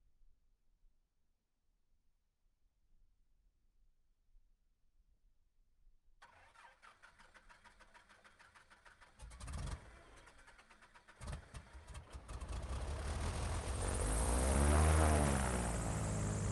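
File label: extra 300 onboard idle start stationary idle increase rpms off engine; vp88; sonniss gdc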